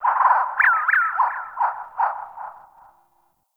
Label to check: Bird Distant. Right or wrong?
Right